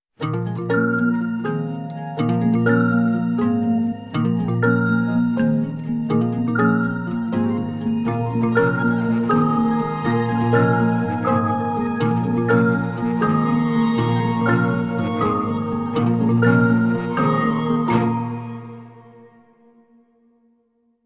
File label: Refill Attempt